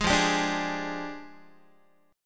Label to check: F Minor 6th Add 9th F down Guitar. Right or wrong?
Right